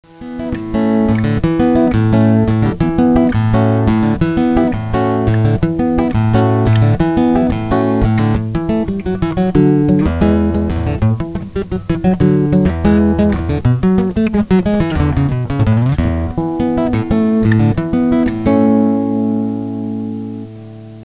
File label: acoustic